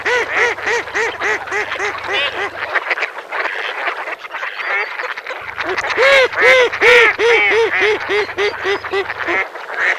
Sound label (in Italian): GERMANO